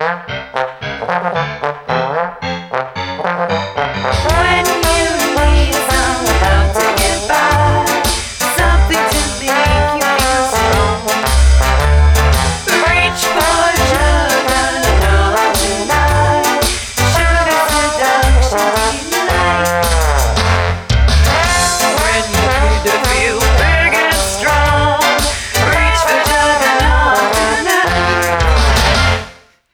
Perk-a-Cola Jingle; Verrückt; Juggernog; Call of Duty Zombies; Treyarch 2008

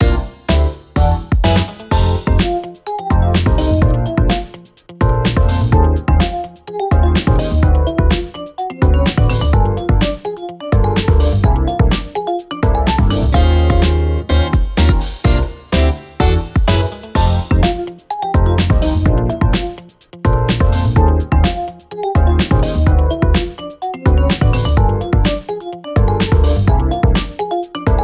BGM Draw Sports; Clubhouse Games: 51 Worldwide Classics

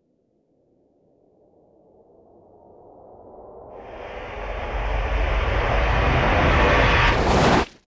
Wakeup; Learn to Fly 2

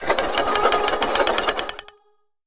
Sound Elevator Heaven; peace death 2017 pc

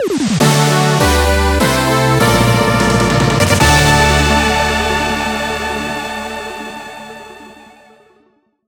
Victory; Shovel Knight

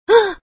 Gasps; Tails

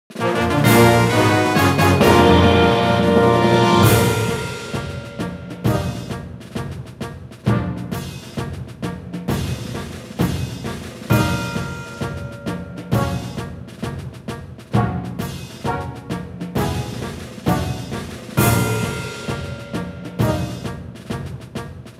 Success; steel diver sub wars; 3ds

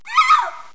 discover civilian

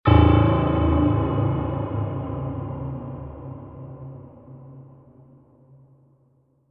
Piano Fail

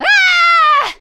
Female Scream; lucius ii the prophecy gamerip 2015 pc macos linux

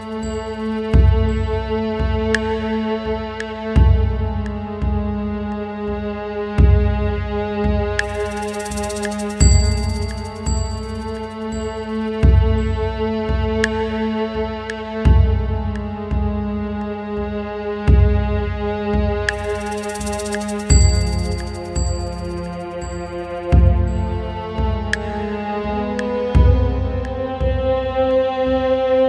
Creepy Suspense; hero of the kingdom

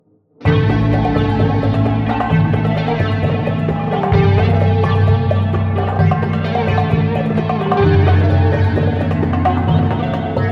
music level undersea Water

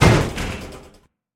Door Slam

